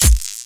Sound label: light sword